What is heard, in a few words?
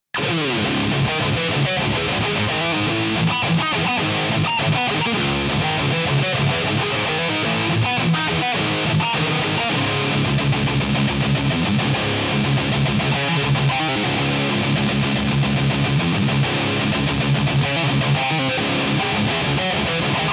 Heavy Riff